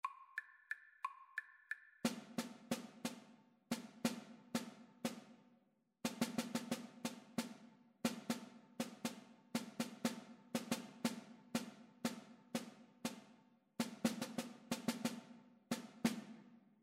rhythmic dictation

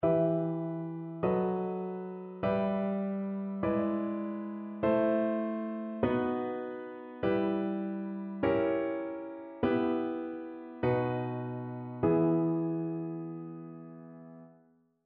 harmonic error detection; ear training

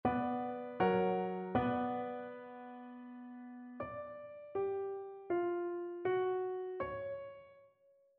melodic fragments; eartraining

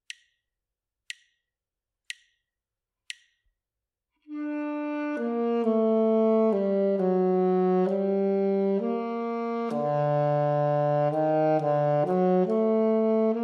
melodic dictation; ear training

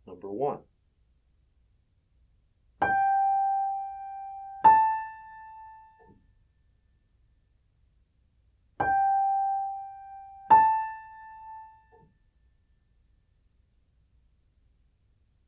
intervals; ear training

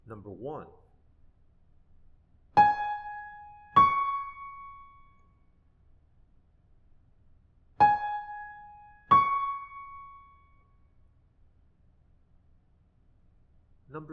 intervals

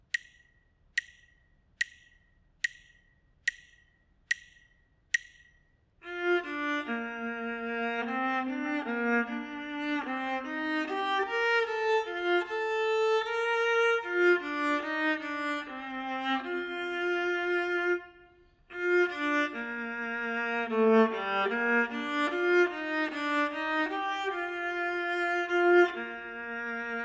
melodic dictation

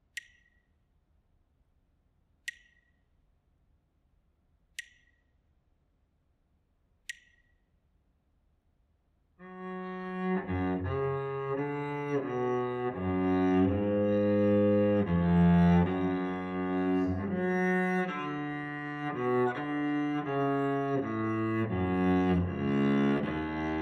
melodic error detection; ear training

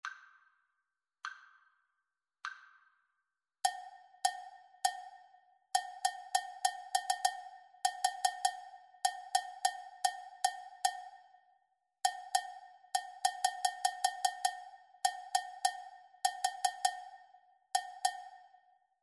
rhythmic dictation; ear training

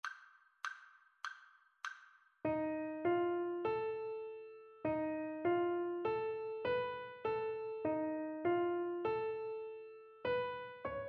melodic dictation; ear training

